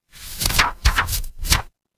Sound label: paper fabric page turn